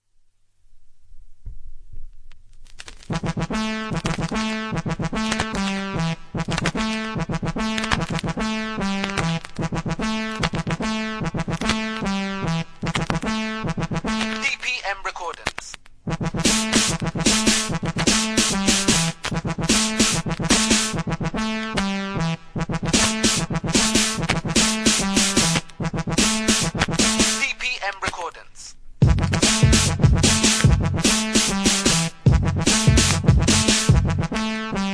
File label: instrumental